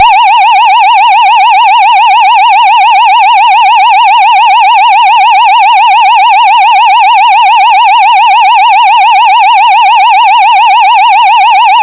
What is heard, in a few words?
Sweeping fast UK, UK BS 5839-1; pfannenberg; signaling-technology; combined-signaling